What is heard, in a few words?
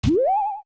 Bonk; Tatl; Zelda; MM